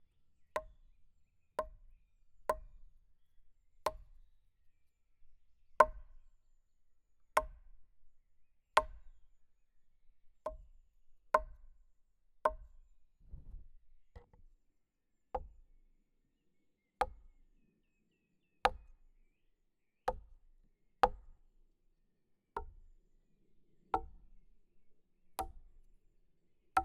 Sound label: Claves x45; Percu Bois